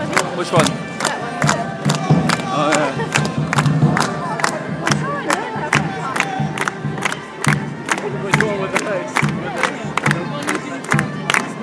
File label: oxford may day music